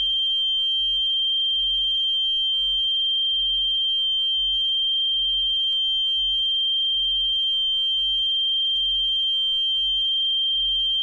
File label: Wow And Flutter Test Tone, Audio-Technica LP2D-USB; AT-LP2D-USB